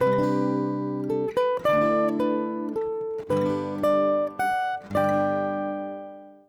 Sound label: guitar variation; ZTE Grand S 2; ringtones; nubia mile